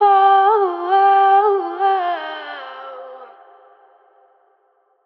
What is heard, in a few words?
Ad Lib Wet C Min, Vocals; Cymatics - Eternity Sample Pack; Ad Libs